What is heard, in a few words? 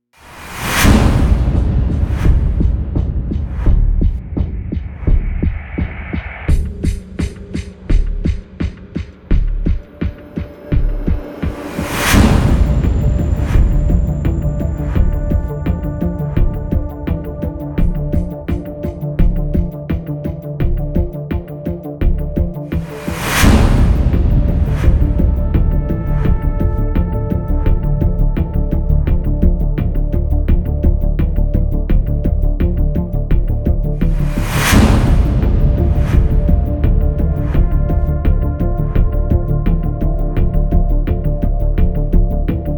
No Ambient Guitar